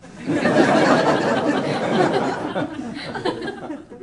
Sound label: MEDIUM, LAUGHTER; 1000fx